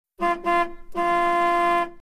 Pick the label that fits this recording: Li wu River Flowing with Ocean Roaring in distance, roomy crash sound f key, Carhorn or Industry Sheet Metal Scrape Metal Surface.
Carhorn